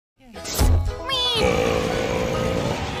wee aaaaaaaaaaah

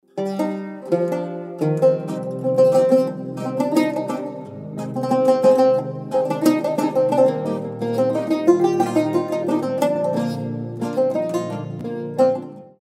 lute

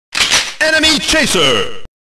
enemy chaser; metal slug

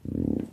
growl; godzilla

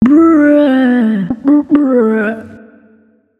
braaaa br br aaaa

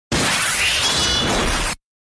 dash

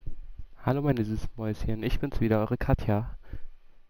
meeeemeeeee

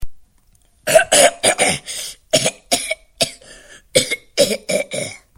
cough lalala

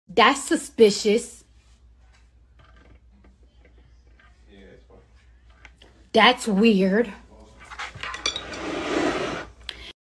weird

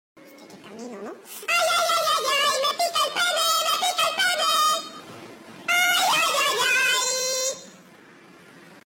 m mmm

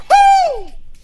ooo

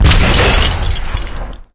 debris explosion; lego star wars

